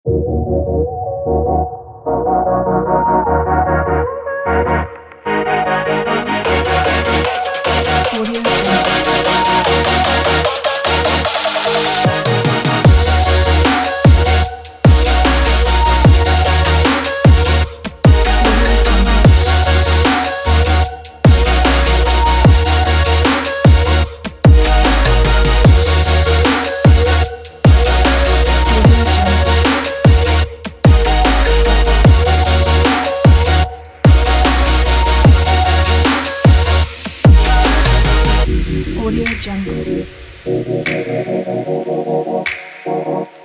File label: future bass